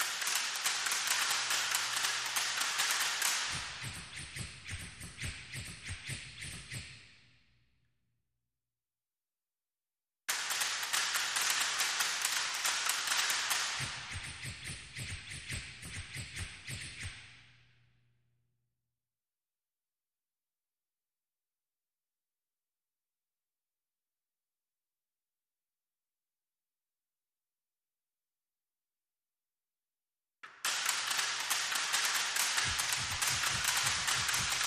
Loop Layer Bamboo

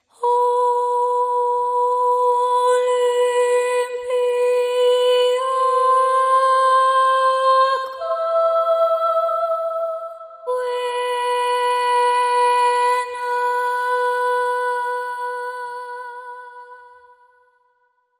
Solo Voice

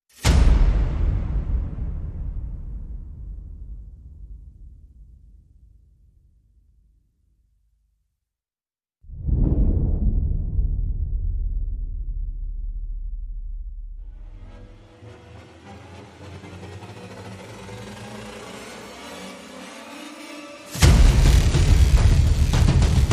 Percussion and FX

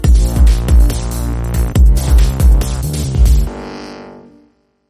Dubstep, Sting

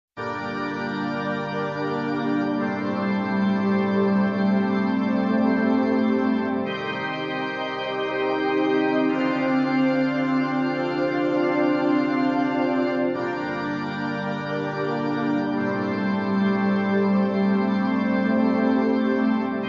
Industrial

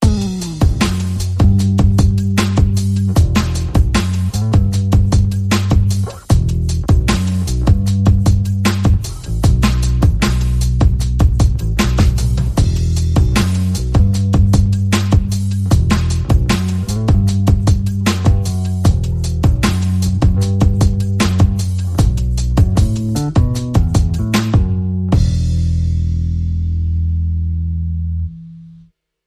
Drum and Bass

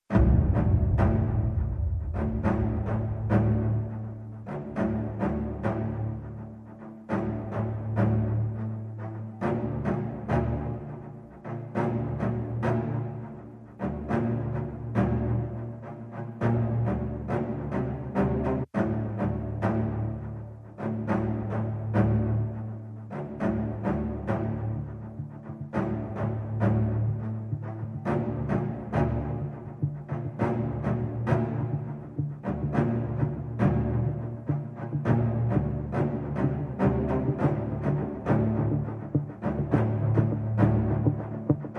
Strings Guitars and SFX Only

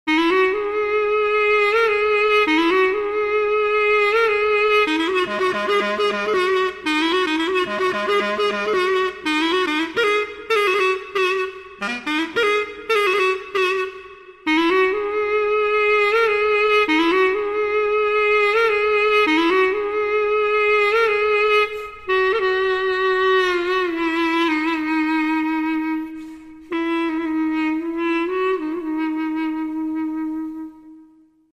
East Clarinet

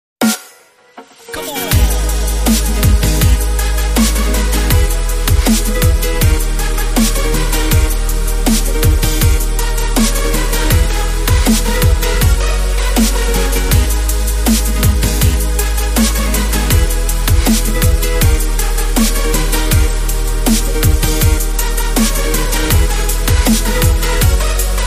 Fast String Short

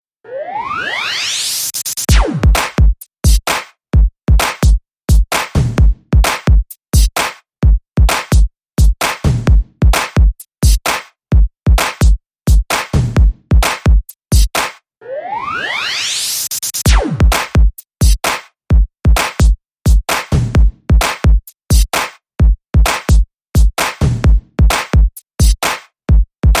Saw Beat

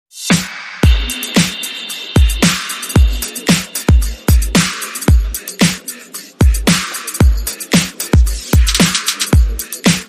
Beat

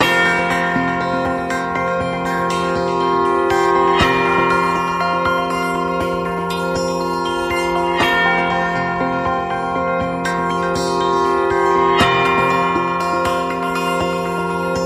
Christmas Bells

